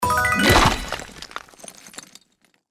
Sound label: SFX Symbol Scatter Win; slots; Wildlife Adventure